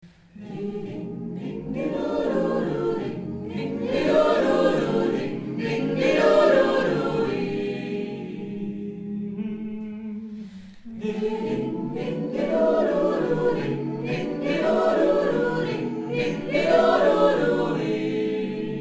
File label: jazz harm